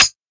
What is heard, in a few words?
00s natural hi hat sample d sharp; Mono; hat samples